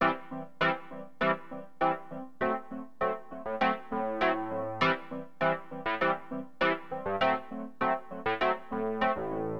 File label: RHODES, AcidJazz Funk Keyboards; LiveAct Pool 1